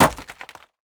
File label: ice hit; teardown; ps5 windows xbox series xs